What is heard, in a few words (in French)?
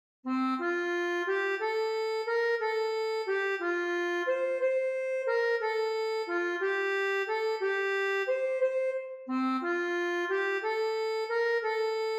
ACCORDEON; KantikSantYann